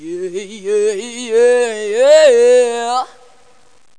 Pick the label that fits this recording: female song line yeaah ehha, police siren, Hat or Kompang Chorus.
female song line yeaah ehha